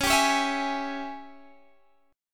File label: G sharp Major 7th Suspended 4th Sharp 5th, C sharp